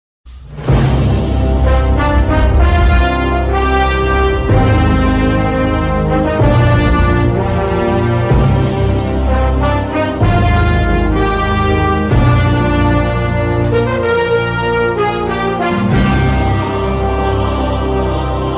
bgm ringtone telugu song ringtone; Khaleja; mahesh babu

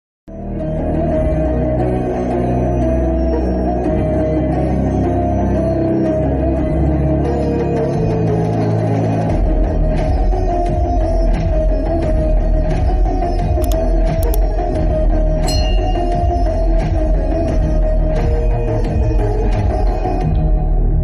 villain bgm; bhagavanth kesari; balakrishna; anil ravipudi

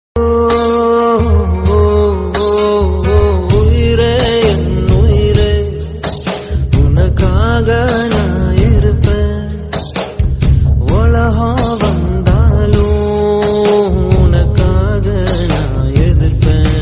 song bgm ringtone tamil; poojai

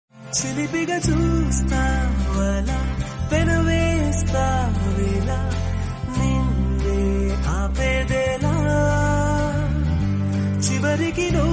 bgm ringtone movie bgm; orange movie

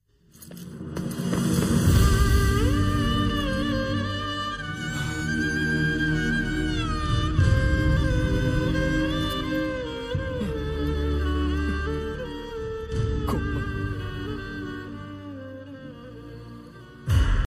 sad violin ringtone; iddarammayilatho; telugu bgm ringtones

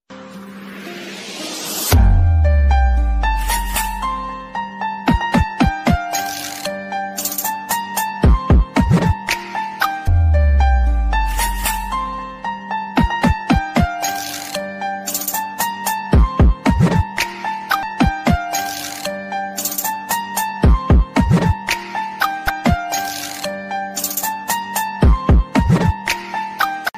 love ringtone; valentine s day ringtone

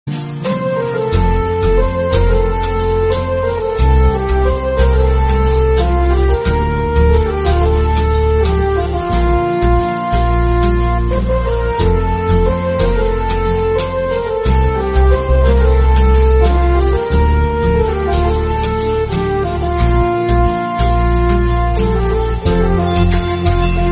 Background Music

